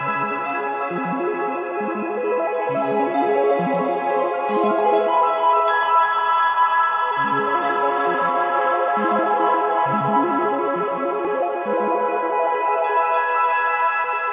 Music Claire De Lune Menu; sayonara wild hearts 2019 gamerip

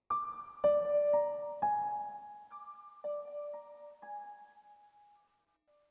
One shot Piano; Zombie Army 4 Dead War

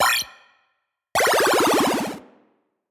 Lose Life

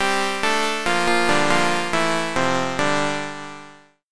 Game Complete; Dungeon of Zolthan

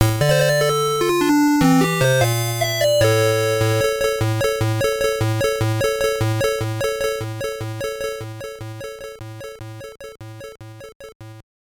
Scene Clear; Rastan Saga; Game Gear

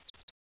Birds

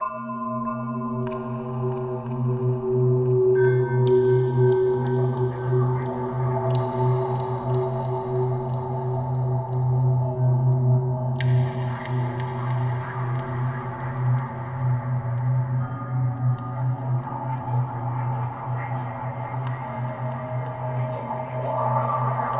Dungeon; Alchemist's Castle; PS4

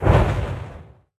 Hands fire; Level16 Bossfight Veznan

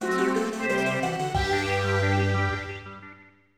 Atlantis Realm Fanfare; Glover; Nintendo 64

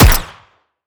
Sciweap Shot Small Gun Slider Dart

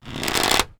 Longbow Pull Back; Timelock VR

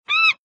beast sound; Caravan Beast

